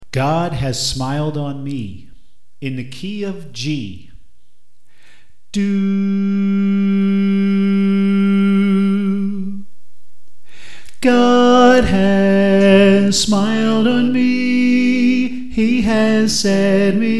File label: Soprano